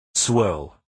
swirl

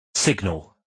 signal

